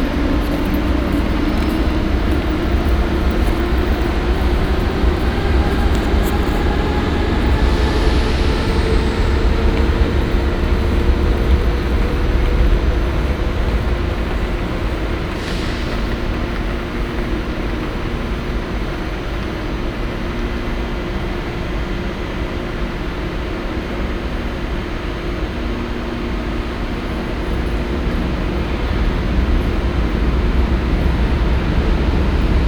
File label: S Bahn Berlin; Sound Scapes